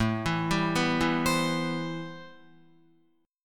A-Minor 9th A Guitar; Am9 arpeggiated; JGuitar

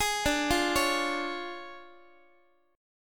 D Minor Major 7th Flat 5th, D, down, Guitar; x,x,11,12,9,12; DmM7b5 arpeggiated

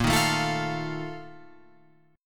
Bb Major 7th Suspended 4th, Guitar, Open F; BbM7sus4 strummed

